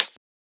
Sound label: Closed Hat; FLPRO